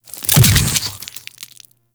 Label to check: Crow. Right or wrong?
Wrong